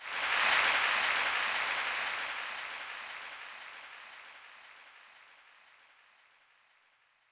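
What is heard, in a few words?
Applause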